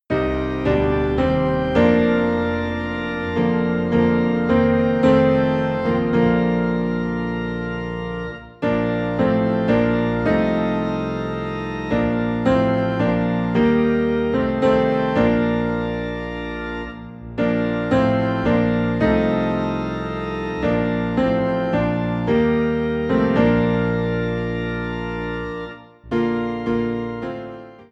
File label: Piano and Organ Low in B Flat; Be Still My Soul